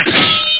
Sword; eldritch

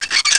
squeak

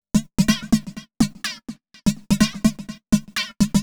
HOUSE 125; eJay

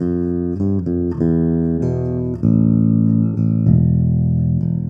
Rock, Bass; ACID MUSIC

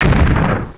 exp small, weapons; Hexen2

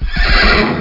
HORSEY; lands

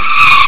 tyres short; wreckage

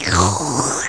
EAT

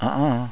miss letter; Hang2000

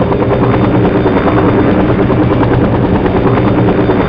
exfil copter; specops

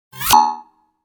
roll

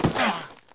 player land; PC Gamer IT CD 41; Daikatana

